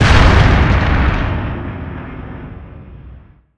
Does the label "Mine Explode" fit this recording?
Yes